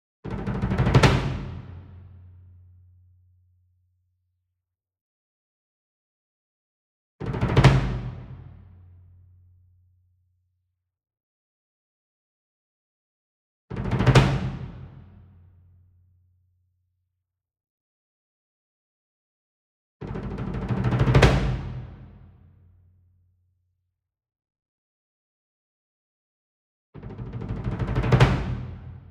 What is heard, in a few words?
SINGLE DRUM Bass Drum Marching Roll Hard Beater Medium; boom library; Cinematic Strikes Construction Kit